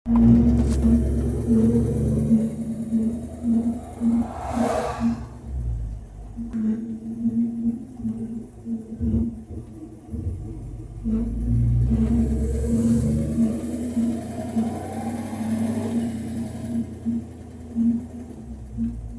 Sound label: weird noise